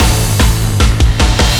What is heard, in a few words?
Burning-Hot Beats